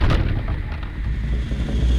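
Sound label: Music Volcano Light 120; from dust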